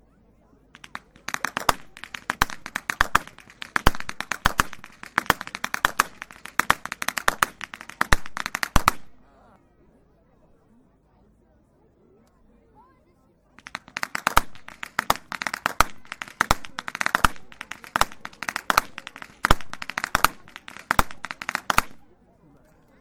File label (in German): schnalzen, jugend